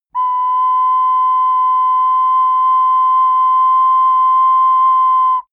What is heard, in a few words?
ocarina unstable low long